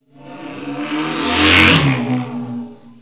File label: moto